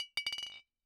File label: Bottle Struck